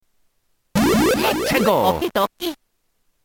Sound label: battle start